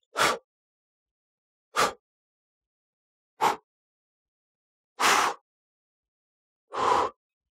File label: blow out candle; شمع فوت کردن